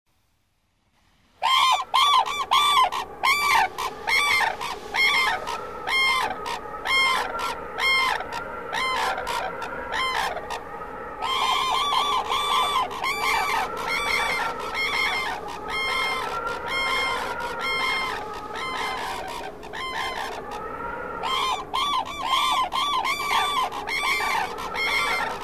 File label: Grulla Japonesa- japanese crane bird; sonoterapia; ANIMAL FLOW